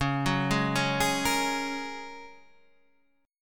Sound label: A sharp Minor 7th Sharp 5th C sharp